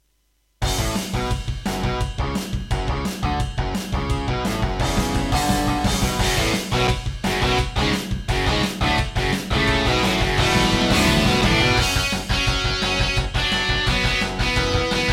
Duke Nukem 3D - Intro, Serdaco MP32L GeneralUser GS Bank